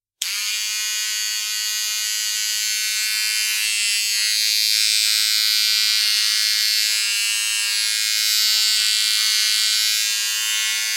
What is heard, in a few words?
shaver